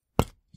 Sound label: stone hit; Kenney's Sound Pack; Foley Sounds; Rocks